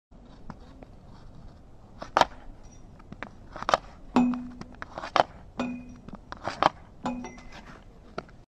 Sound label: ice hockey; هاکی روی یخ